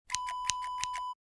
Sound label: scissors snip; Scissors Snip Wav Sound Effect